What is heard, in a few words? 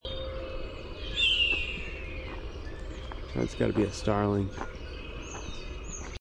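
European Starling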